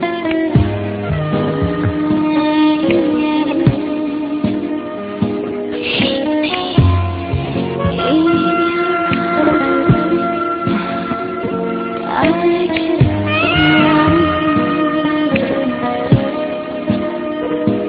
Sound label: RINGTONE